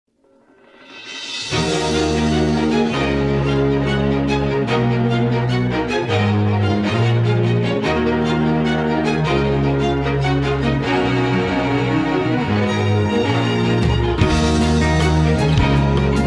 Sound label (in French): Musique